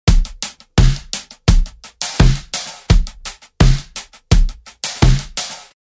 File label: 85 DRUM LOOP